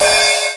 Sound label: cymbal